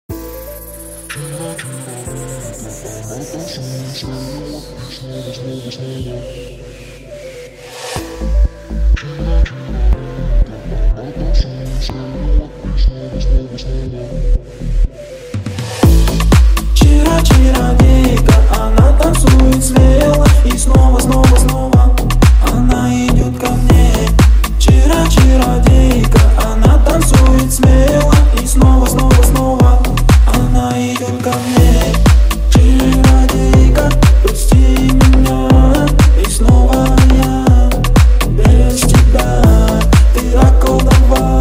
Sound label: remix